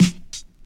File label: old school hip hop snare f key; snare drum samples